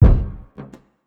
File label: Kick; Ableton Live 12 Lite; Core Library; Drums